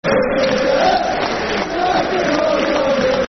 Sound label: Football Chants (Soccer Songs), Cardiff City; fanchants-com